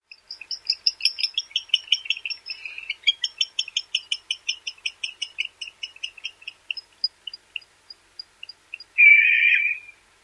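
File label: Barn Owl tinkling calls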